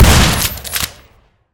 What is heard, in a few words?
weapon; Vince Mastery